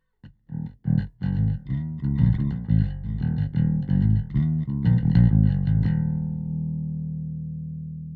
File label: SSL X Gate bass; Bass Gentle